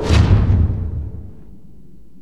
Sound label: smash